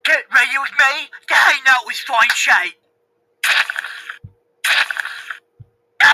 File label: scream